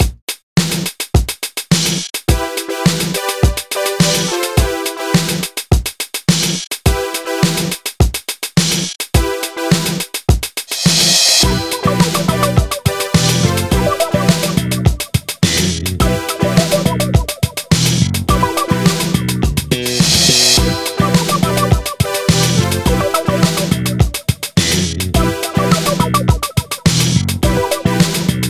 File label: Hip Hop